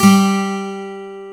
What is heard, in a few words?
12STR, AC.GTRS